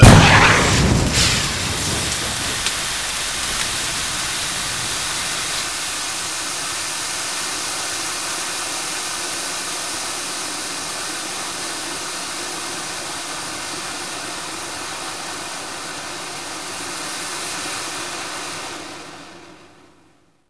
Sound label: explode, grenades; cstrike